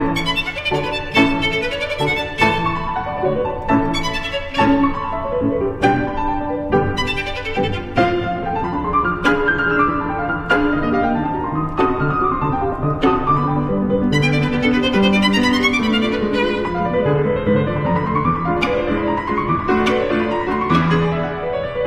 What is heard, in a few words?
Violin Sonata in A Major; piano; Violin Sonata No. 1 in A Major, Opus 13- Mvmt 3 Allegro vivo